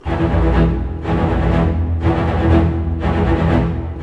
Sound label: SNAR, Snare Army; East West - Scoring Tools